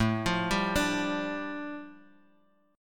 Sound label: A 7th Suspended 4th Sharp 5th, A, down; A7sus4#5 arpeggiated